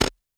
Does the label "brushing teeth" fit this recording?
No